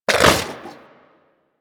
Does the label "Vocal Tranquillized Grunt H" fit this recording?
Yes